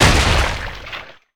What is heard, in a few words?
Blood Splat Gib; Lucius III; pc linux